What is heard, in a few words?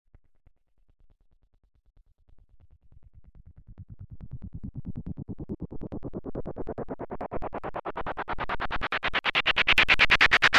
Pink Noise Delayed; Techno